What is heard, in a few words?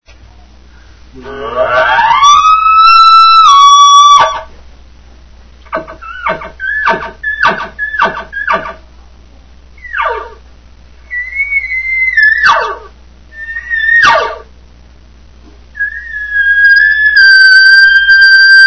bull, elk; elk 2001